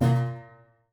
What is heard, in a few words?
Prepared Piano